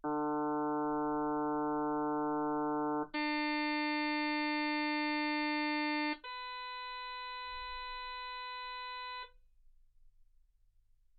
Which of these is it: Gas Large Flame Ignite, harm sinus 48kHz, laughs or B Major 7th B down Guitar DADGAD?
harm sinus 48kHz